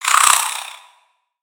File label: Spider Angry; Lego; aronwk collection; ndaudio; creatures mechanical